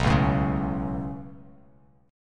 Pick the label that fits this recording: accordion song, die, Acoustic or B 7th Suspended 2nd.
B 7th Suspended 2nd